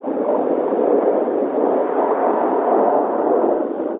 wind

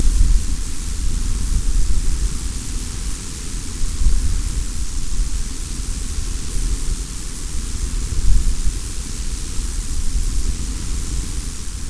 wind

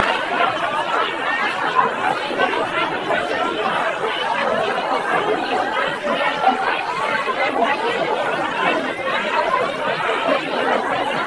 laughter

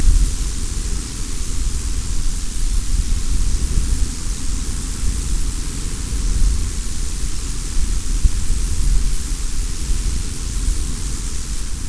wind

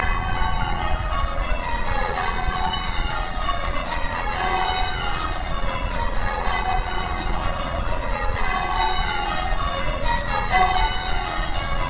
bells

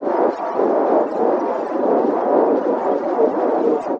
wind

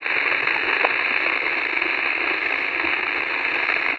static

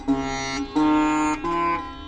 sitar

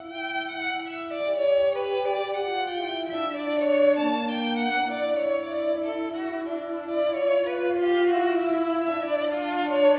trio synth conv mix

